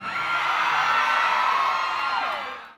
Fans Screaming

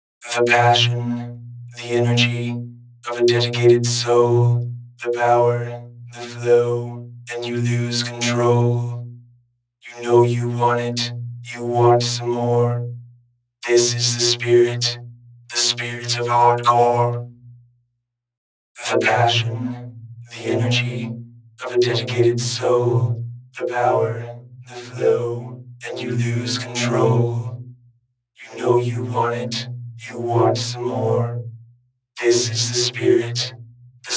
Spirit Of Hardcore Vocoder

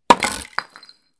Piece of wood

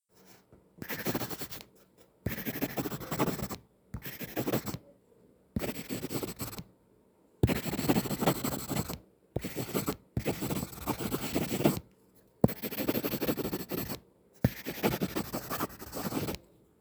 Pencil

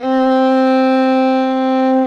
Violin Bit

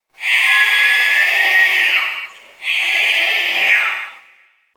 Monster; Nazgul

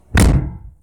Old Trunk Outside

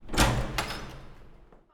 Door Wood Old Open Hall Echo

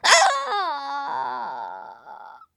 Witch Hit

